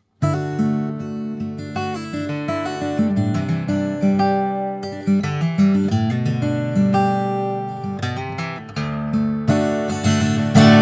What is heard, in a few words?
Guitar